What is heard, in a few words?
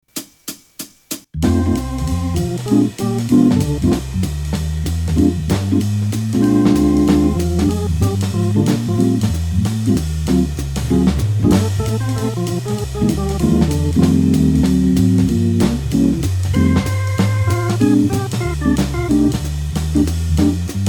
Jazz Blues F